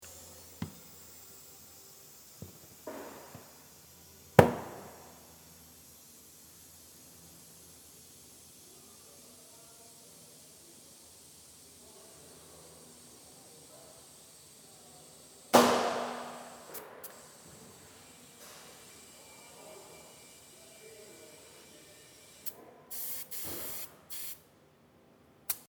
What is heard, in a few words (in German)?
Autowerkstatt zischen